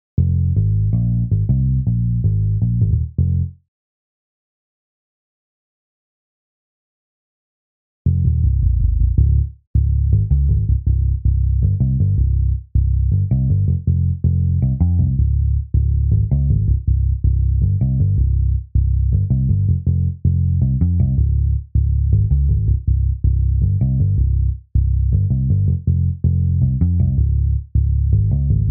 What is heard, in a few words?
Bass